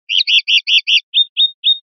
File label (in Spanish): pinzonada, pardillo